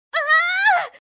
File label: female aaa